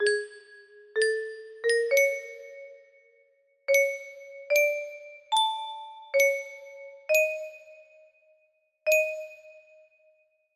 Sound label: melody